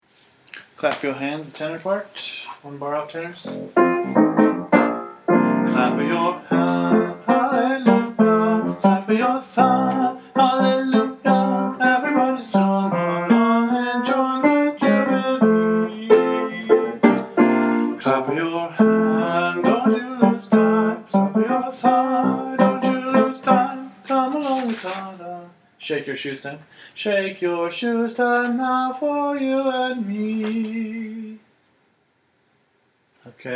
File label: clap yo hands, tenor